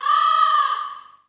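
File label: bird crow; EnvSound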